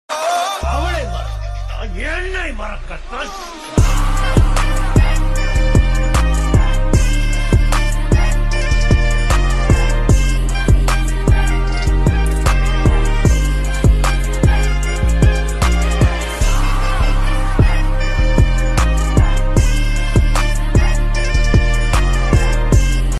tamil love ringtone love ringtone tamil tamil love bgm love ringtone love bgm